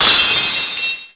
lock shatter; trilogy

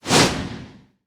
jump

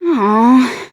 ooow; mukuro

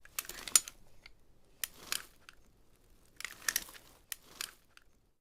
roll in

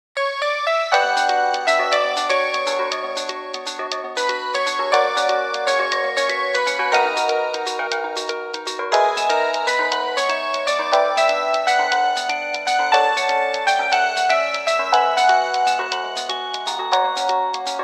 ringtone - guitar's serenade

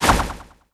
thumb in; thoughtroute

